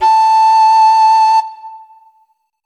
Instrument samples; peppa pig world adventures

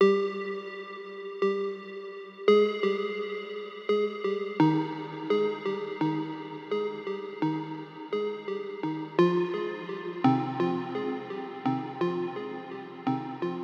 Overlay Tone; Flytrap

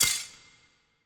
Card Finish Break

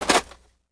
Shovel Dirt

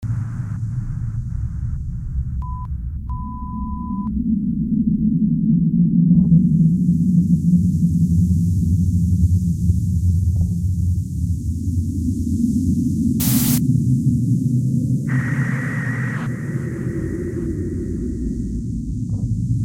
Ambience; SCP-895; SCP Containment Breach

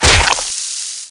SFX ACID HIT

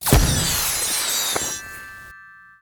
Fx Transforma; Levels Hub Mansion; Memory Charlotte; Fx Transformation

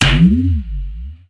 Clack Hit Hard

